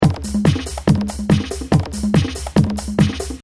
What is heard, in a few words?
Techno Trance Drum Loop 142 Bpm Busy Break; Drum Loops; Lynne Music